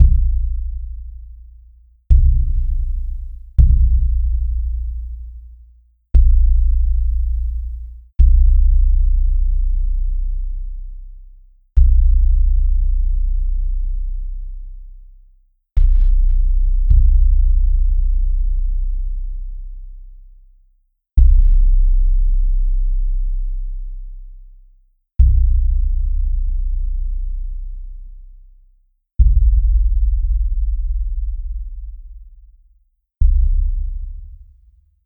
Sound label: Kickdrum